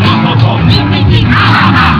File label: laugh